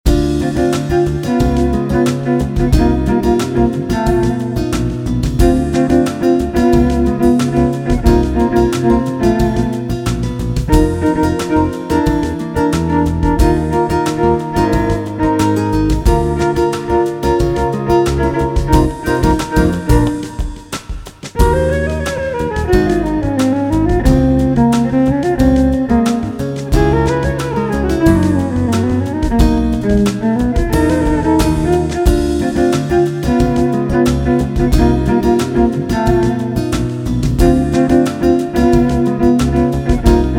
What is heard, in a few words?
electric cello